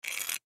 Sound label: Wood Rasp Pick Up File; Hand Tools; INDUSTRY